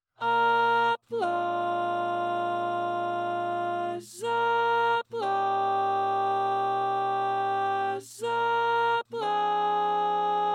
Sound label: Applause Applause Applause-Tenor, barbershop tags